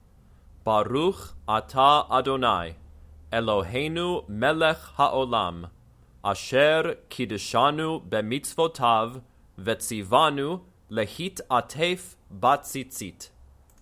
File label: Tallit Blessing